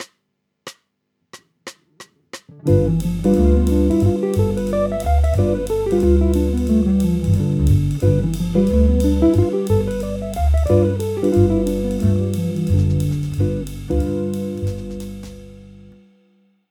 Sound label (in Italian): G7 in posizione di A in drop 2